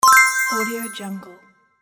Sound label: pick coin